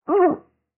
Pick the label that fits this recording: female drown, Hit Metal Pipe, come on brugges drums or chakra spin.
female drown